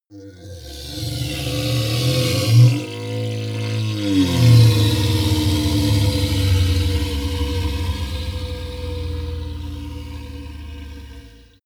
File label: golem growl call; schreie